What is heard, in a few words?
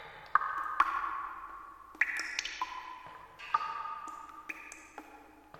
Water Dripping Loop; Silent Hill Downpour